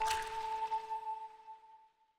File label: drop